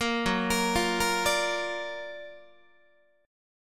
Gb Augmented Major 7th Gb down Guitar 4A,4E,5A,5D,5G sharp,6C sharp; Gb+M7 arpeggiated; JGuitar